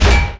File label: UI button; Fieldrunners